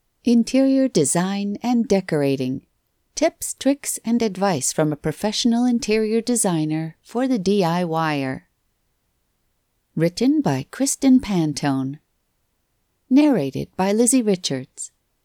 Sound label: opening credits